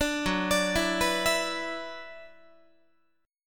Gb 7th Suspended 4th Sharp 5th Gb Guitar; Gb7sus4#5 arpeggiated

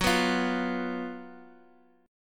Gb Major 7th Suspended 4th Gb; GbM7sus4; strummed; JGuitar

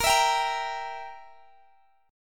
E Diminished Bb

